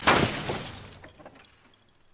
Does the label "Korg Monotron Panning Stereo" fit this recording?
No